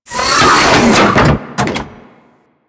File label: Door Close; Warframe; Liset; Hub; Lower Deck